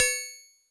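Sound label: Harpsichord DX100 C sharp 6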